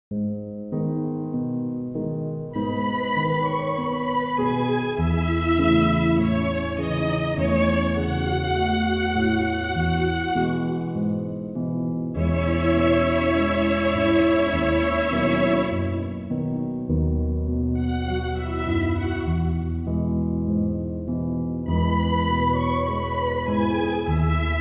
Relaxing Piano Music Chillout Piano Session Sounds by the Ocean